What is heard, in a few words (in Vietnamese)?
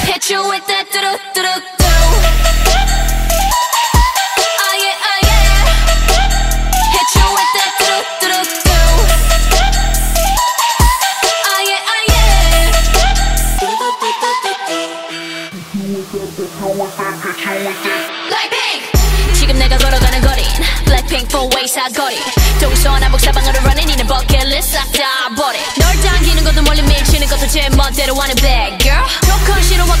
bao thuc, Nhạc Chuông